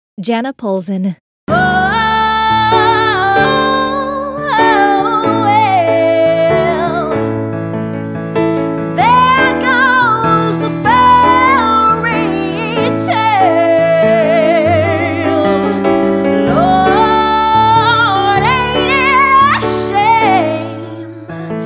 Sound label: Singing